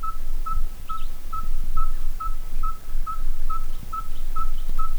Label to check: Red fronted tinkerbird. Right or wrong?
Right